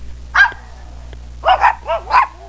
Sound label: bark; penny